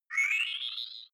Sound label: game start